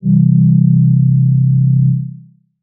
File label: Subtle Pad Chord, Old Skool House; Big Fish Audio; OSH Chords